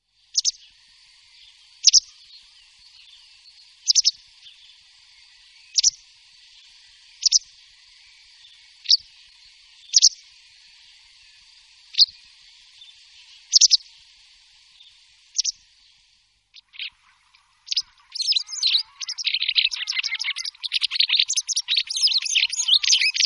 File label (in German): Bachstelze, Voegel